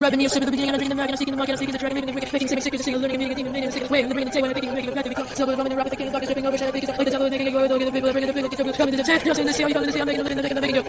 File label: drops sick beat